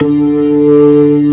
ORG VK, B-3 ORGANS Vinta Keys B3

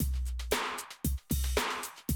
Classic Drum Loops

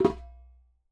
MIXED DRUMS

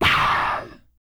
BLAST, MALE PERC; Spectrasonics Vocal Planet; Jazz FX

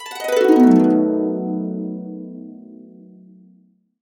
B, Harps; USB Soundscan vol.62; AKAI

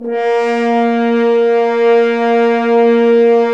BRS, FHns Dry; Roland L-CDX-03 Disk 2; F.Horns 2 f

